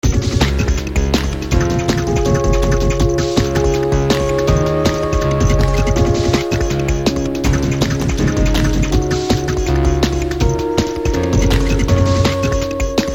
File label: soft idm